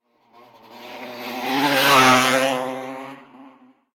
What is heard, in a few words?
motorbike; Blind Drive